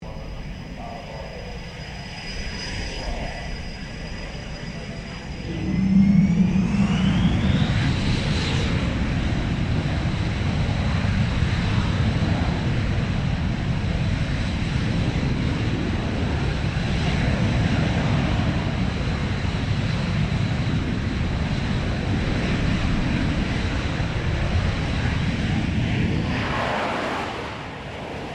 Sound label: AIRCRAFT UNKNOWN JET LARGE TAKEOFF; Various unidentified aircraft; Airplane; Vehicles